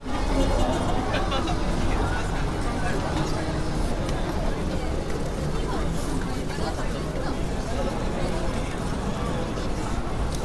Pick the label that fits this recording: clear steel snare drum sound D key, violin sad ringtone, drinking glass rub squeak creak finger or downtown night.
downtown night